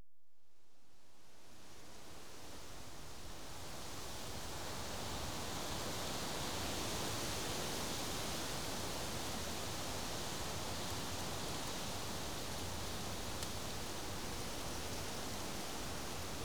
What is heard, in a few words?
Wind Blowing in Trees